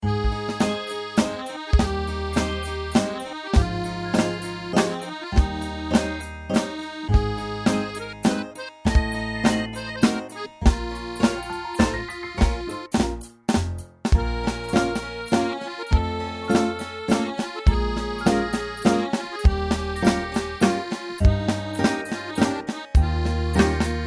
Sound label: 3/4 waltz piece